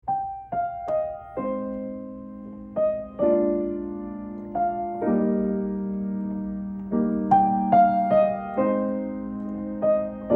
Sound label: Piano